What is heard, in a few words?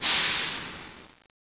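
Emergency Brake, Train; P42DC x2 11 Car Superliner Long Distance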